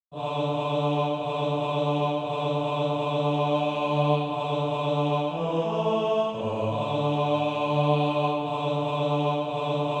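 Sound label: bass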